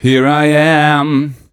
m 127 mel a, Vocals

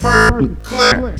ROBOT VOX R; Big Beat Samples & More; AKAI; VOX FX1 KIT

